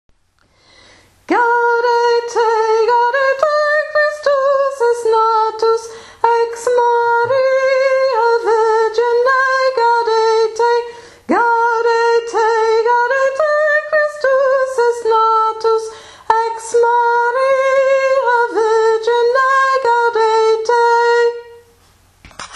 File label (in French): voix soprane; gaudete